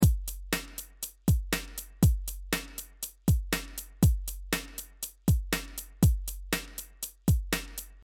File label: POP ROCK